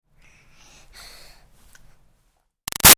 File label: Baby 6 Month Old Breaths Sigh; Struggles, Lite; Babies; Voice